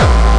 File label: obnoxious kick